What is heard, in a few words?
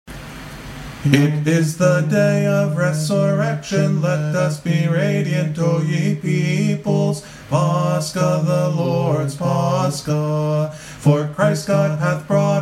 paschal canon ode